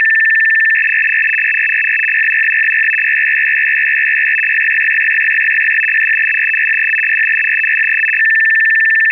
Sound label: Baudot 1.5 stopbit; 150 Bd/850 Hz; French Navy